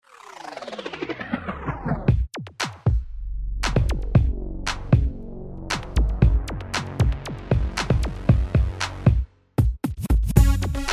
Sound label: Techno Synth